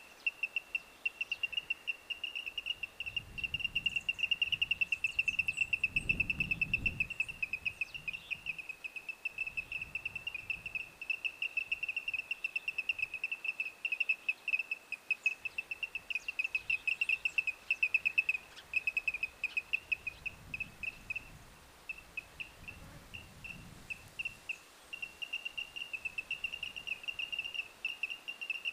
zold gyurgyalag hangja

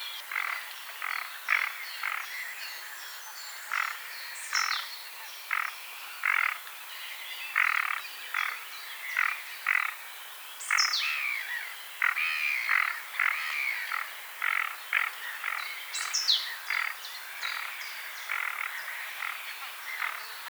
kontyos rece hangja

